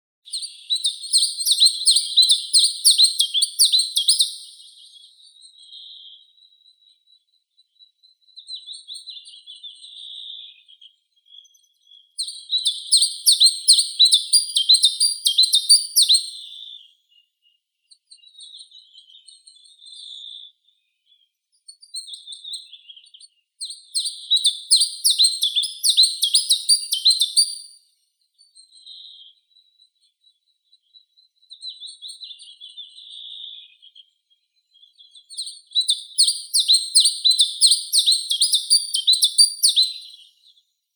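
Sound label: chiffchaff, Phylloscopus collybita; penochka tenkovka; zvuki prirodi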